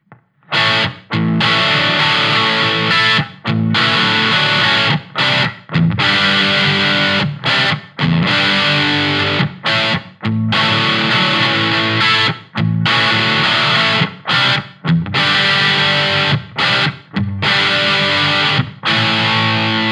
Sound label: Line6 Spider V 120MkII Lead